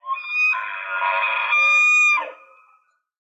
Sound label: zombie pig; Minecraft; Votive World